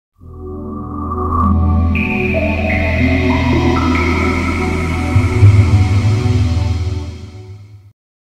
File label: Sega Dreamcast Jingle; 44.1KHz Stereo